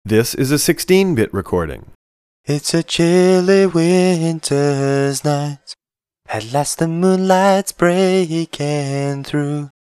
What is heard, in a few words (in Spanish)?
moduladora vocoder